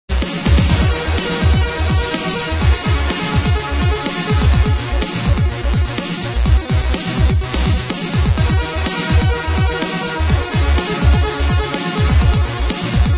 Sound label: Synth Action Remix